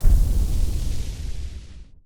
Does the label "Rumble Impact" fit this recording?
Yes